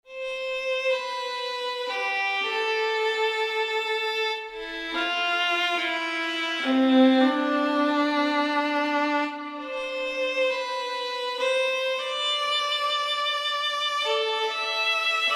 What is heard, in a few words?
String Instruments; Relaxing Music